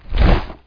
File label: flap